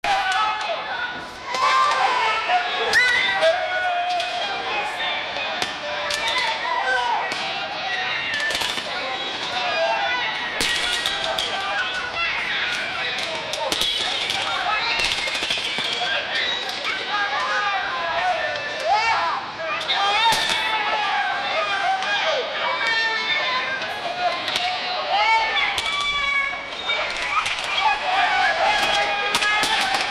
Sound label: a noisy shooting brawl in, wild west